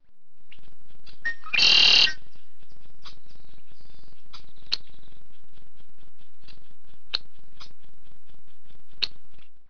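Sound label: blackbird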